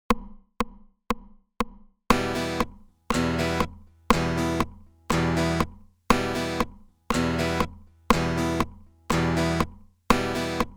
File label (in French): Couper le son